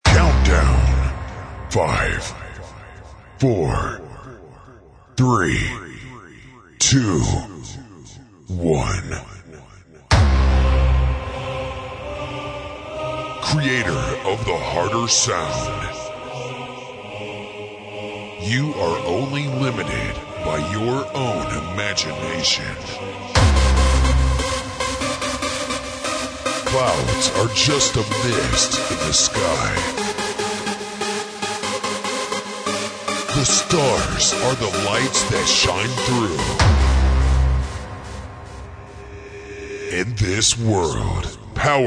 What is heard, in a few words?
Hardstyle Vocals